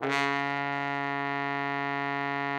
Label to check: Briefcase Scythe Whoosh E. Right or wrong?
Wrong